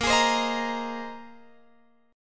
Bb 7th Suspended 2nd Suspended 4th Bb, Guitar Open F; Bb7sus2sus4 strummed; x,17,20,19,18,17